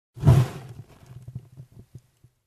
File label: Flamethrower short whoosh